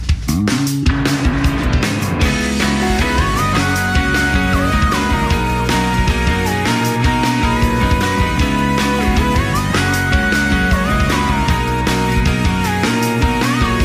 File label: Waltz; Honey Clover